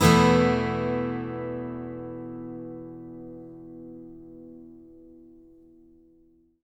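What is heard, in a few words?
acoustic guitar E sus up, Whole Lotta Country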